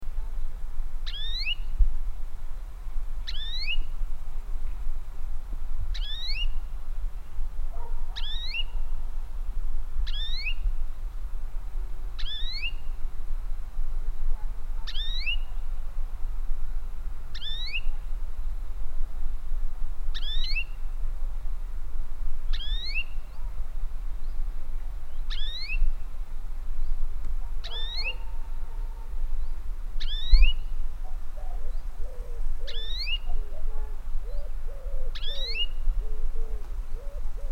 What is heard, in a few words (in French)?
verdier appel